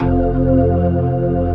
P-ORG F1, POWER ORGAN

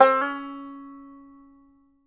BANJO NOTES, DB4; Sampleheads; Whole Lotta Country 1 & 2

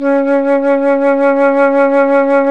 FLT FLUTE, Flt vib; Roland L-CDX-03 Disk 1

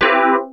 dx7 Synths

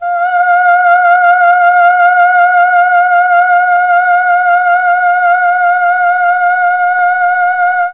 F3 FEMALE, Mellotron; Mike Pinder - Mellotron Archive CD

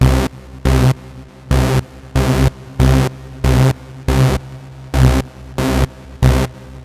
Trance Bassloop 140bpm, Bass Arps

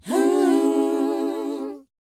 WHOA B, Vocal R&B & Dance; ILIO; Vocal Planet

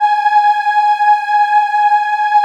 G#4 WOMEN EH, Choir; USB Soundscan vol.28; Acoustic & Synth; AKAI

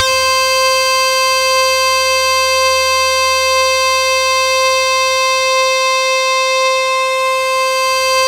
C5, Synth Line; 90 s Sample CDs; NiceBeats; SynthLine VOL-33

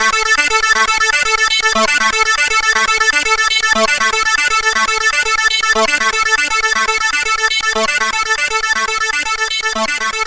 Arpeggiation, EDM; Sonic Foundry (Sony Creative Software); Afterhours EDM; Retro Active

